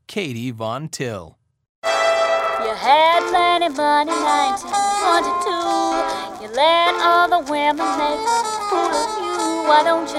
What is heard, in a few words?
Singing